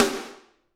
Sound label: SD, Drums; Bob Clearmountain Drums I